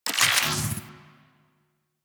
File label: Infuse Magnetic UI; Warframe; Warframes; Alchemist